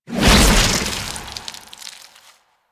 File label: Shield Charge Throw; DE; Warframe; Garuda